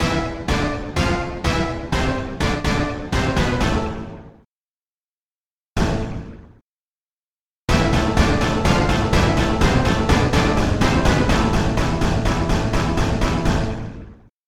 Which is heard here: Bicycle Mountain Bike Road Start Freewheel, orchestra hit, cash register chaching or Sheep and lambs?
orchestra hit